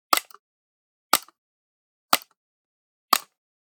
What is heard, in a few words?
Generic Button Plastic Medium